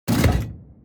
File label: gun turret deactivate; fight